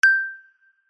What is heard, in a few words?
ding ringtone; sms ringtones